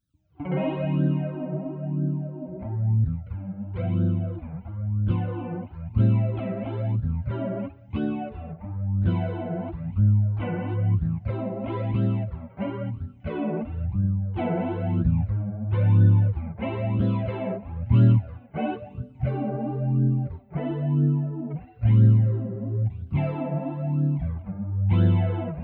Loud Phased Jazz Chords

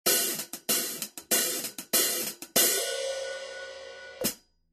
HH 14

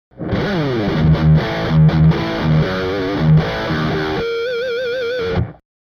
Dirty Amp

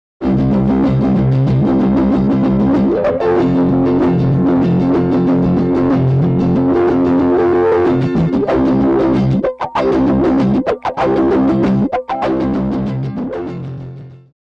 Velveteen Zipper

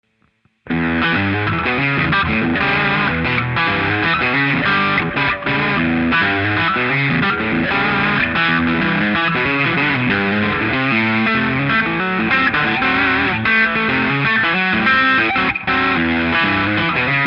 Shuffle

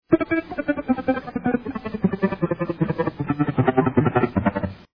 1 string bow

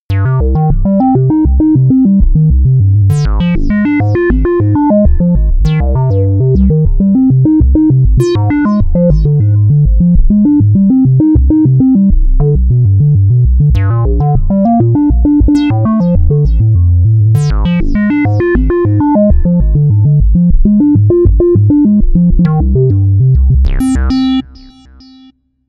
Square Legato Bass

